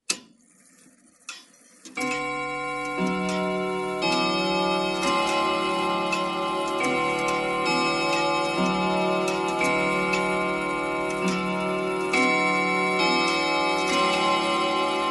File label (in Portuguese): efeitos sonoros badaladas de relogio de parede; vinhetas